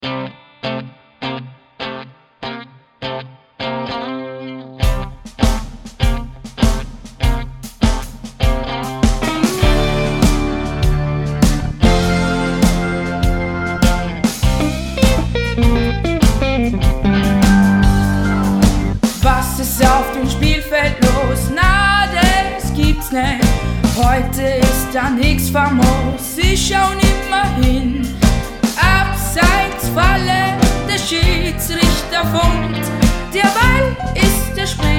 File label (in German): Kickerblues